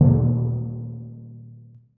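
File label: timpani p right hand d#1; Percussion; Sonatina Symphonic Orchestra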